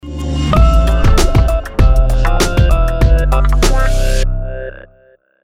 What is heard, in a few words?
modern funk tag